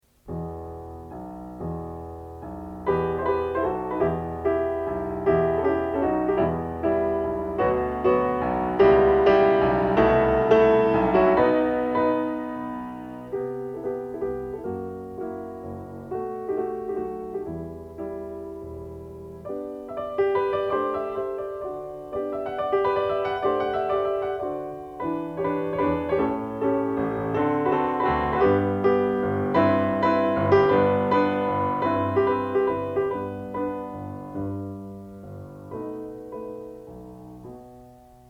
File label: Folk Song; Grieg; Lyric Pieces; Op.38 No.2; rubinstein